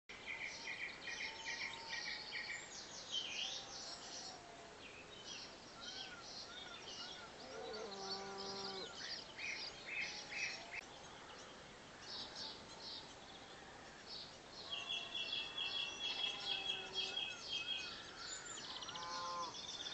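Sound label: honey buzzard calls wailing calls; hexhamshire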